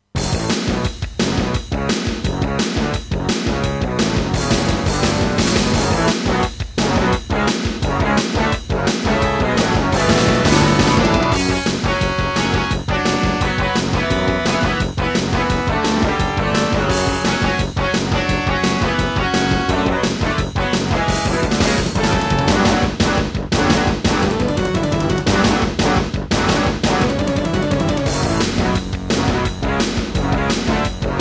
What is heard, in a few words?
Intro, Diamond Monster Sound 0.5MB; Duke Nukem 3D